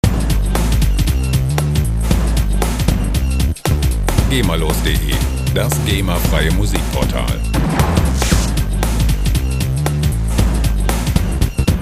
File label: time tunnel, Breakbeat Loops; gemafreie Loops